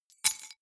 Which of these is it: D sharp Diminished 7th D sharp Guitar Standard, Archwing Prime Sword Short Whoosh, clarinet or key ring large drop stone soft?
key ring large drop stone soft